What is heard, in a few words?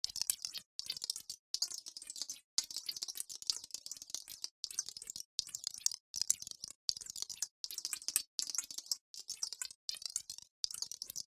ASMR Binural ASMR Wet Noises; Loopable